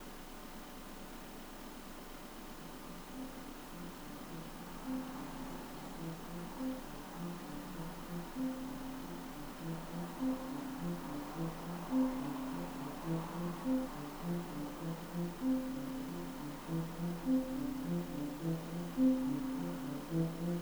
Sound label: Ambience; Ambience for April